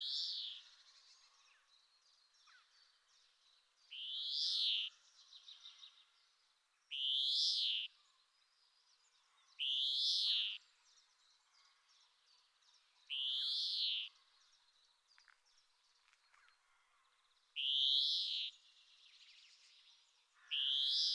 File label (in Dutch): Groenling